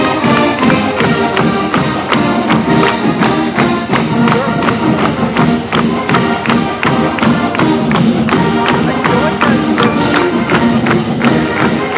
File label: student band concert on steps of Bond Hall, fight song; notre dame